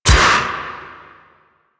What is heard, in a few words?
Melee Hit; Warframe; Voruna